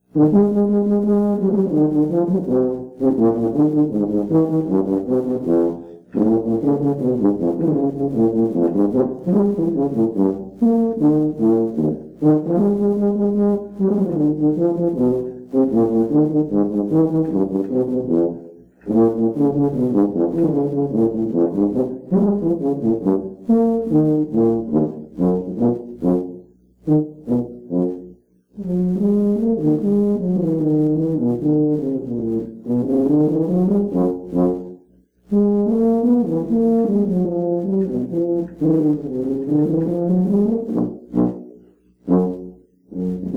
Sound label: tuba